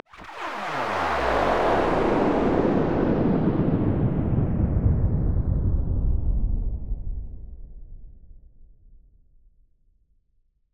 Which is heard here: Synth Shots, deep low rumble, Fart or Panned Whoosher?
Panned Whoosher